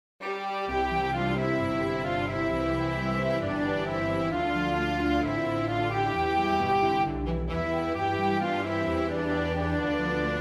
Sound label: Dream Orch